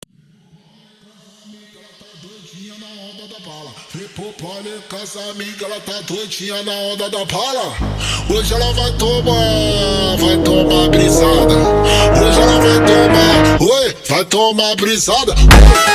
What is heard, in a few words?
brazilian phonk